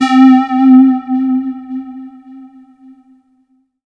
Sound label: C5, Synth Line; 90 s Sample CDs; NiceBeats; SynthLine VOL-23